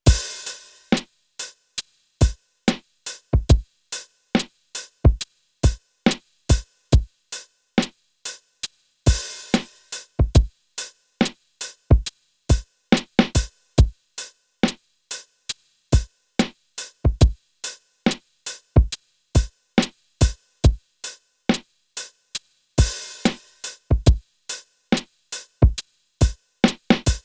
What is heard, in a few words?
lofi drum